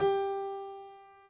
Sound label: piano